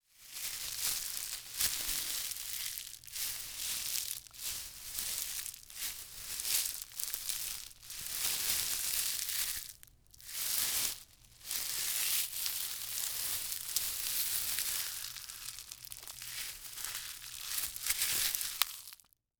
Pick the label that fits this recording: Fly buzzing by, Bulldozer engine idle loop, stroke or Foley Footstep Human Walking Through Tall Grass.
Foley Footstep Human Walking Through Tall Grass